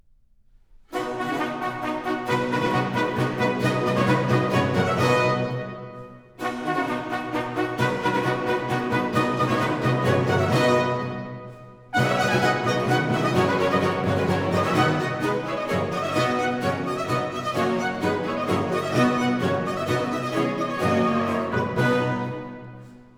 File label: Trumpet Tune; Baroque; Purcell; Highlights Opern